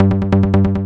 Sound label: Bass; Techno Music Maker